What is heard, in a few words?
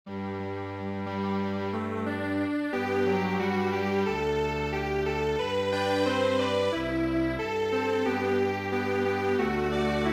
Strings Quartet